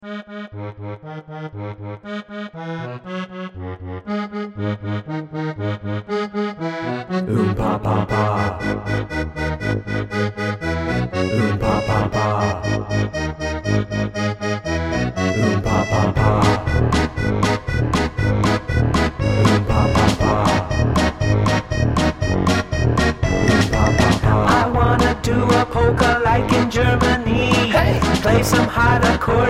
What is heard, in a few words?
Polka Bass